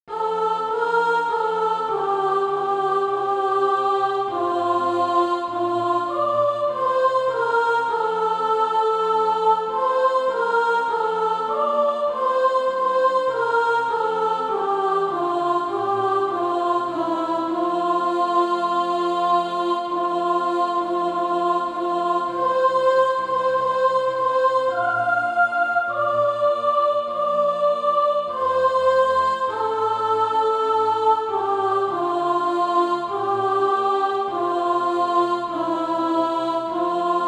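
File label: soprano; From Egypt Lately Come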